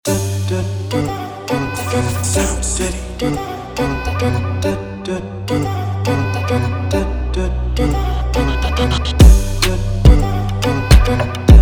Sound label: Instrumental, chill beats